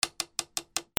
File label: BATHROOM